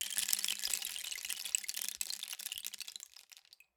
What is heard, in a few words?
Rain Stick, Percussion Samples